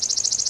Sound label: Chipping Sparrow; Passive Acoustic Sensing; CornellWorkshop2017